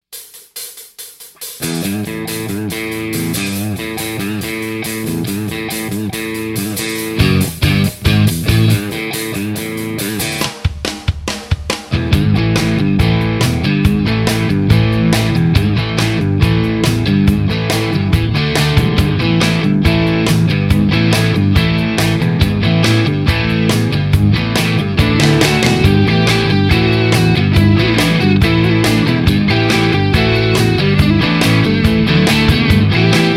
Texas Style

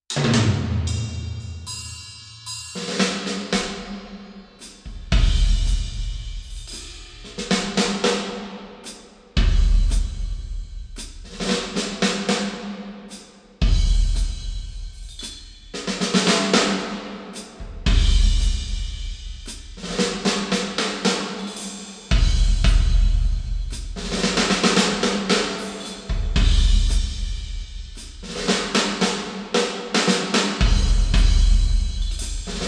parking garage stick drums only; tascam

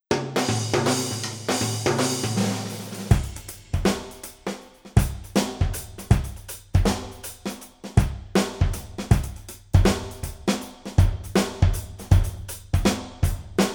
dancehall rod (drums only); tascam

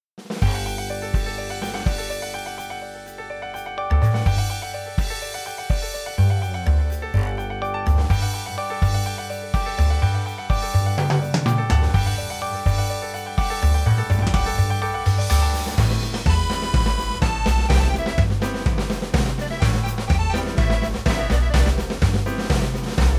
dry clean stick; tascam

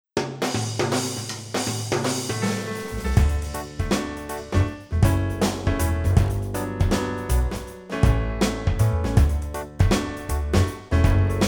dancehall; tascam; rod

